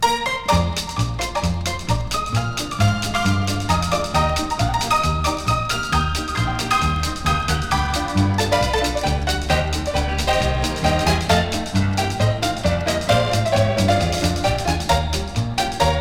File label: barrelhouse honky tonk piano stereo